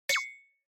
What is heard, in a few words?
Onoma Surprise High